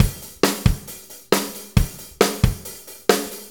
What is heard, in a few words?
Petty, Drum Tools, Loops; Sonic Foundry (Sony Creative Software); Loops & Samples Drum Tools